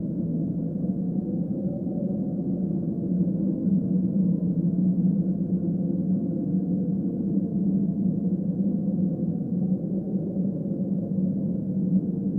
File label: ambience wind